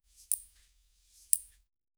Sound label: soft coin ticks; flowing